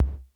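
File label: CR78 bass drum; 90 sSampleCDs; Crypton - DrumBase5000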